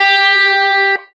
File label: electric guitar